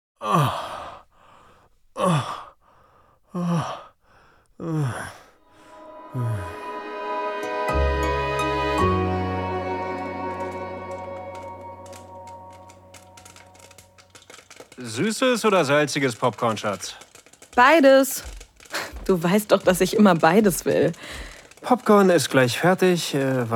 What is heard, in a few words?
male moaning; unser heissester serienabend mit you erotik geschichten